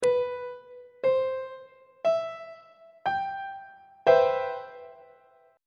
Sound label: d double flat major 7th 3rd inversion on treble clef